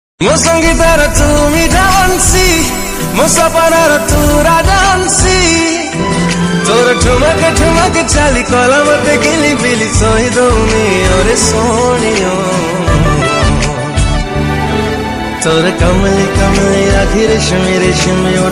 Romantic Odia Song Ringtone

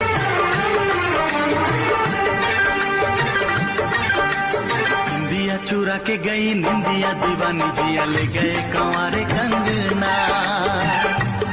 Old Ringtone

